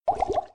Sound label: Item Potion Small